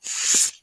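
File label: page turn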